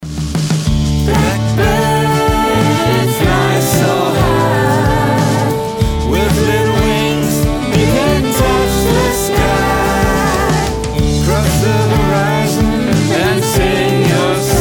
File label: Recording Vocals